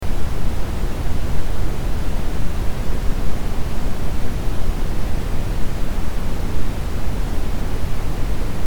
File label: white noise